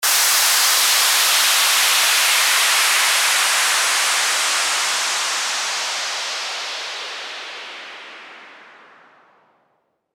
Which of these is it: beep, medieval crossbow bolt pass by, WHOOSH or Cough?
WHOOSH